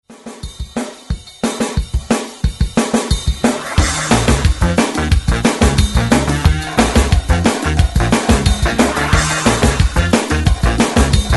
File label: 80s Re Drum 180 Bpm; DJ DOLLS